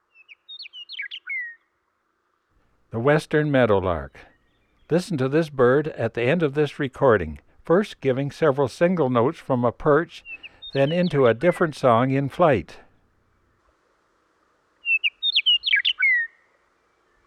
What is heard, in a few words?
Western Meadowlark; Western North America; Dan Gibson; Wildlife Identification By Sound